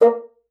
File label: bassoon, A#3; Xsample VOL-6